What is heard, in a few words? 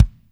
kick; dsp2008 sound library